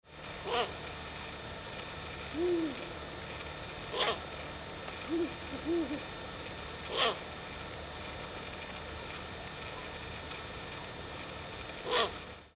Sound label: strix uralensis